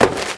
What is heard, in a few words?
Crash Part B hop; XBOX; Amped 2